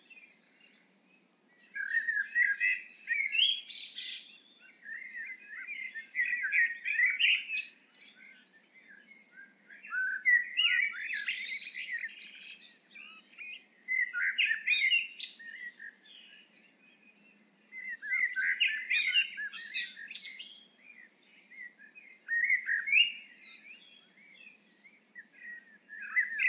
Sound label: Turdus merula; SETE